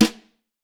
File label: Vintage Snare Drum